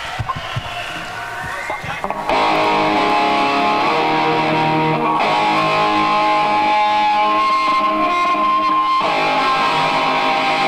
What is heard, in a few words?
more tuning up; De Montfort Hall, Leicester